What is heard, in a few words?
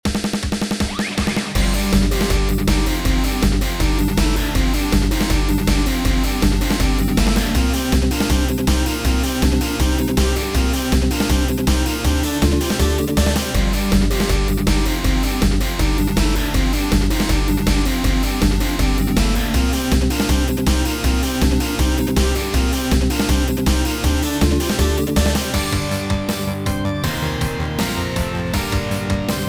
boss battle